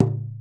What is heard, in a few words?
LOG, INDIAN PERC; Big Fish Audio - Didgeridoo and Other Primitive Instruments